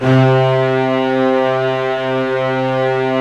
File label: STRNG C2